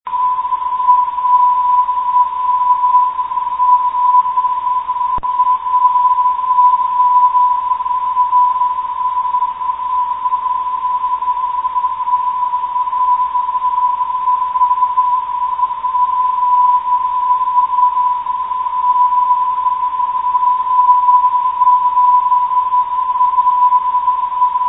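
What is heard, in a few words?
at 345.92khz, non-directional beacon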